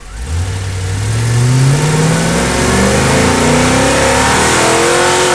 ENGINE ACCEL

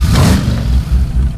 START UP

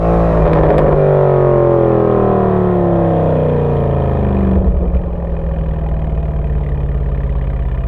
EXHAUST DECEL

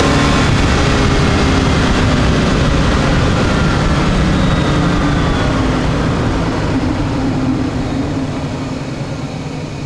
ENGINE DECEL

